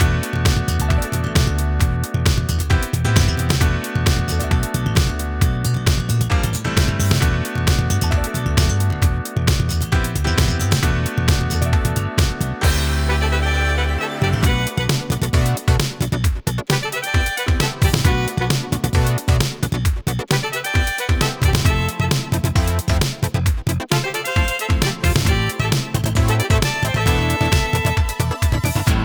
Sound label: Cruise - 133 bpm